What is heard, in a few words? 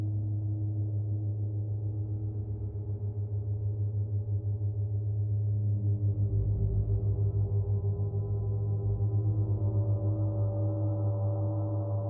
Gong